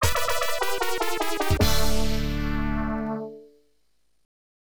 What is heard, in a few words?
Landing Failed; top landing air system; arcade